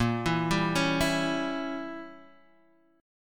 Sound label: Bb Diminished 7th Bb Guitar Half Step Down, chord; chord shape